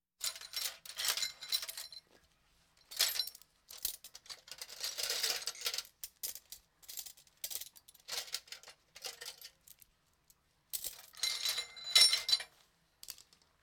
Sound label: Hospital effects